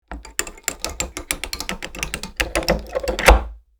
Heavy complex lock rotating mechanism; locks keys

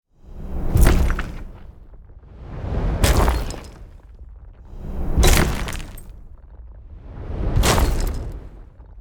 Dragon footsteps ground shaking loop

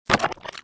Futuristic button

